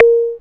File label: A#, Synclav FM PERCUSSION; Big Fish Audio